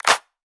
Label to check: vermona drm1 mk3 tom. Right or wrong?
Wrong